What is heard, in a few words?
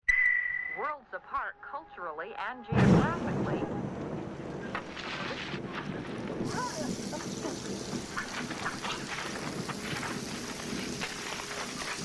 drum army rainstick